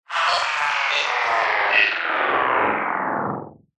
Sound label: science fiction robot death glitch malfunction; zapsplat